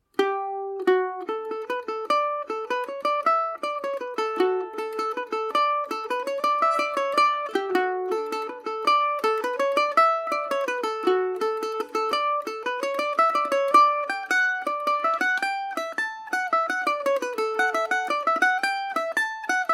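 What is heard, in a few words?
D major paris swing, irish mandolin; hunting the hare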